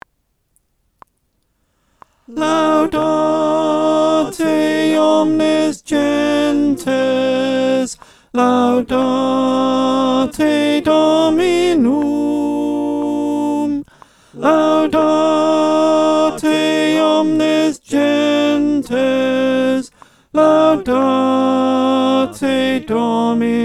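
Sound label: alto, choir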